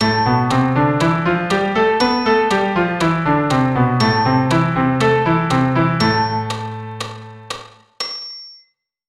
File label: Am natural